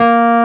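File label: WURLY NO, Wurlitzer electric piano; Sonic Reality; Vintage Timetraveler; WURLITZ EP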